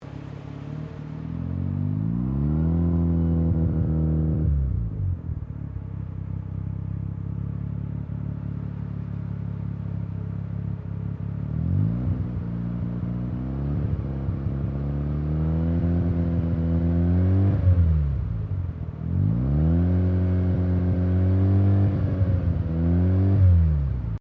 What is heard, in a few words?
CAR MAZDA RX7 SERIES3 EXTERIOR SIDE IDLE THROTTLE UP